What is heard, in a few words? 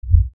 Lfe Swell Low Bass Movement; Swells; Blastwave; Sound Effects:Sound Design